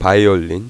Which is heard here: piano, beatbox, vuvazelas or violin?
violin